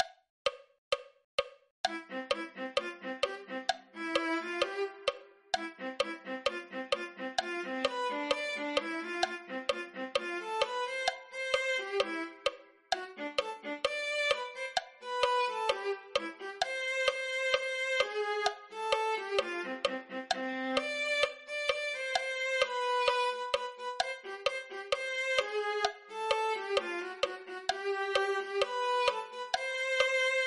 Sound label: viola